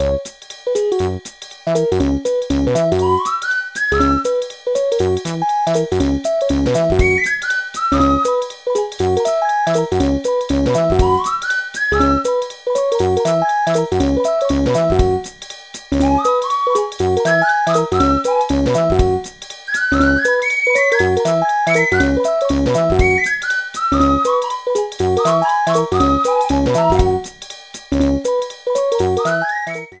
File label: Coaster Hills Menu